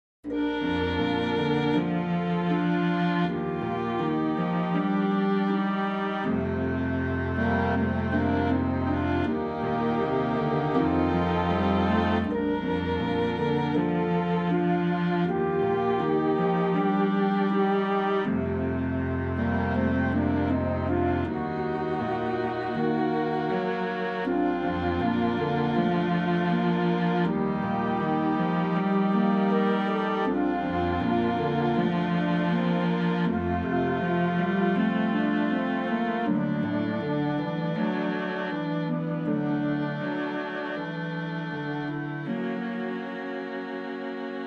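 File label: Low accompaniment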